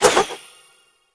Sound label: fire; smite; god raijin